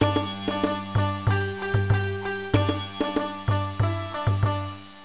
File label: Monkey Dance